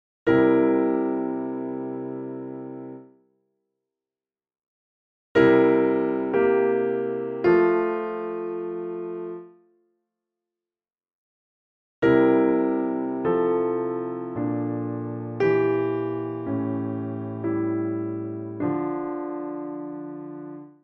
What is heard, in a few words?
m maj7